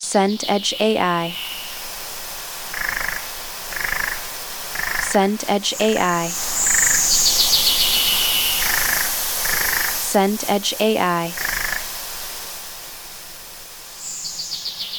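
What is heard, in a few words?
ambience forest day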